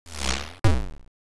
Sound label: menu scroll